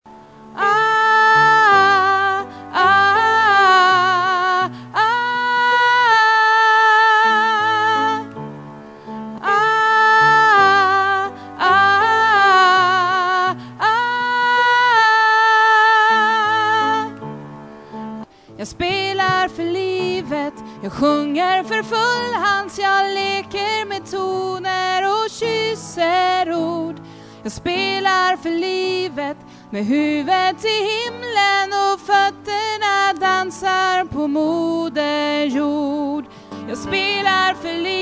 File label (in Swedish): sopran, stammor